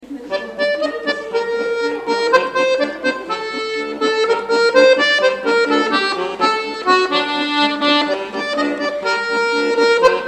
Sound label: Humppa